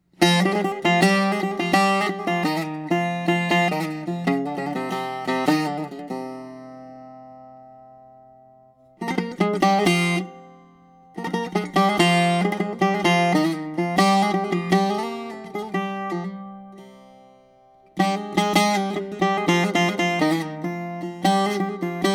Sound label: Bouzouki Improvisation